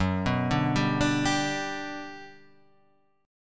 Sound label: G Major F sharp, chord